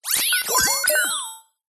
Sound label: science fiction computer tone fm activated or similar; zapsplat